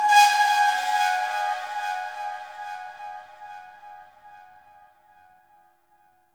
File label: Metal Scrap Texture Med, Corpus Ship ambience